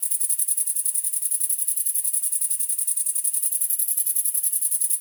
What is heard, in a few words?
Meadow Close Grasshopper; Ambience; Day Animals